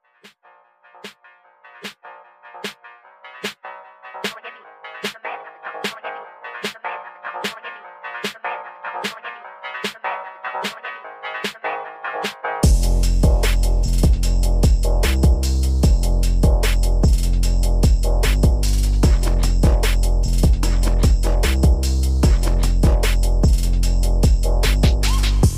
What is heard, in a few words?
Phonk